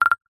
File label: multimedia notification alert clicks fast